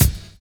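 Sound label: NICE snare; Univers Sons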